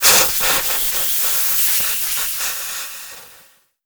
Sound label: radiator start; Modern Warfare